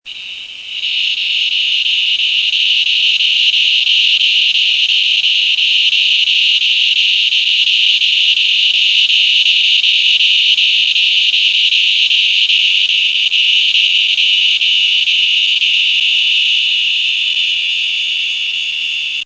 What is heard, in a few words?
T auletes, cicadas